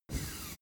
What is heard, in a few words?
office chair hydraulics up smooth